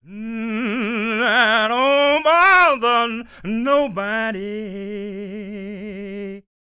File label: SING, Blues Soul; Spectrasonics Vocal Planet